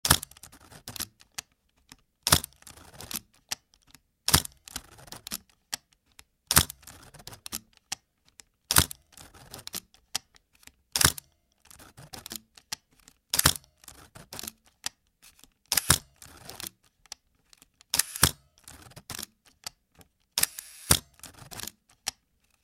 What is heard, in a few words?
OLYMPUS MD